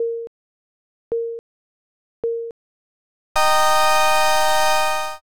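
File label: countdown new year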